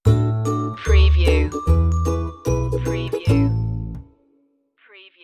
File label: yip jump stinger